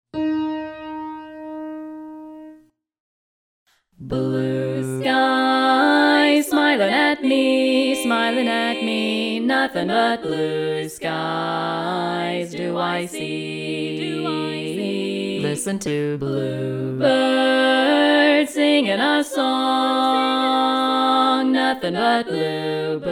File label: loud bass